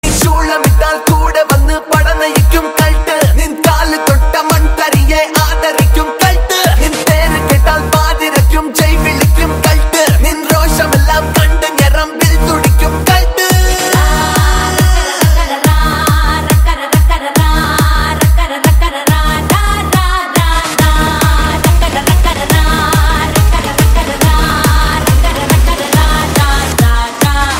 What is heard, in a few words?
MALAYALAM RINGTONE